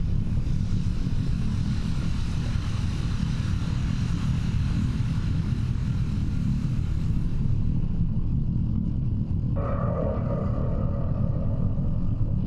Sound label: ship science ship idle; Stellaris; machineage